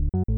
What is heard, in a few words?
Did something mysterious; mother2